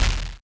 impact explo; burutaru

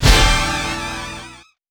ultra clear; ponponchun

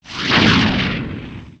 ship spawn; burutaru

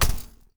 barrier hit

